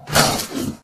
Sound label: croc jaw snap; minecraft; technic